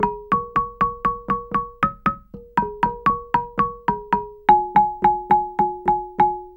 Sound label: GAMBANG PH; Spectrasonics; Heart of Asia